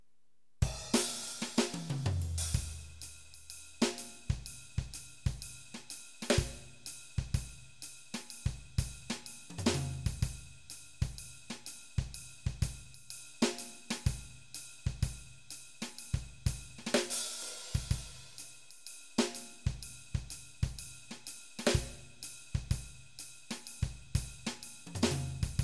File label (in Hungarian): jazz blues kiseret alap, gitár